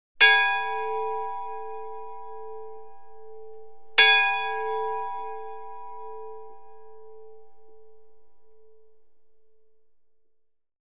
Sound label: Lutine Bell - Rung twice (for an overdue ship); Cities; The BBC Sound Effects Library